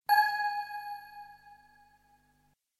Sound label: Lifeline Ping; Millionaire Soundtrack; US Revival (2020)